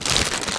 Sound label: Close Window; KDE; knotify